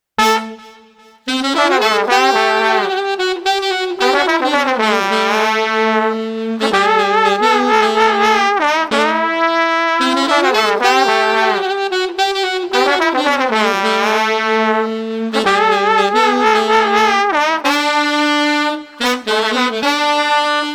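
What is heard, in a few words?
Street Sax Trombone Combo Upbeat no Drums, 110 bpm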